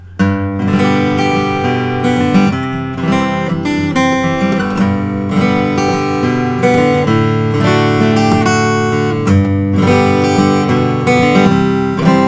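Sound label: collings ds3 mahogany varnish; sb; sunburst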